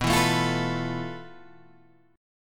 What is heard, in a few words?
F Major 7th Flat 5th B, guitar chord